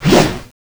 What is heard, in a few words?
wrench miss